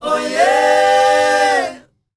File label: O YEAH, S. AFRICAN, World Voices